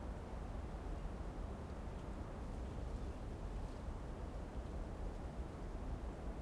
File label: BG Town; blue archive